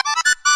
paper mario lucky

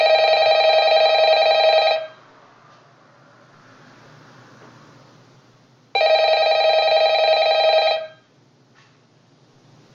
phone ringing sound; fnaf

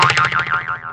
wooden boing

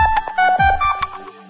notification welcoming; huawei

